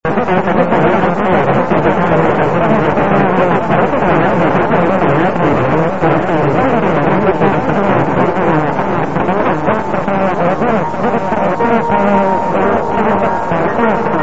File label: sound fm modulated